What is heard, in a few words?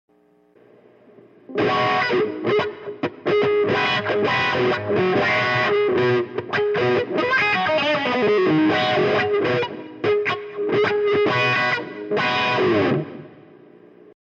wah